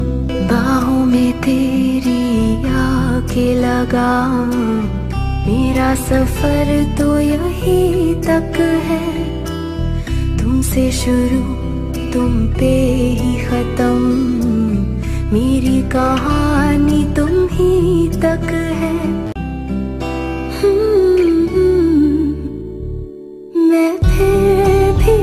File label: Bollywood Female Song Ringtone